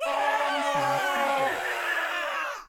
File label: scream; BALLY; TWILIGHT ZONE; Pinsound